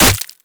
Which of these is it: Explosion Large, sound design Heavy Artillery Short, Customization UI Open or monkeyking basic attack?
monkeyking basic attack